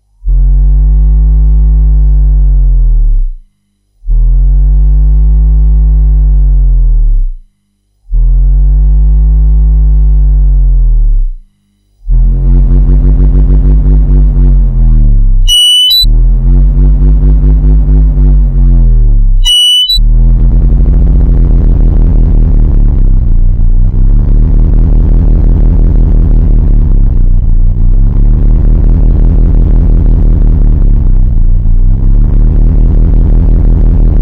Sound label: speaking waves